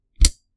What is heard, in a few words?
Switch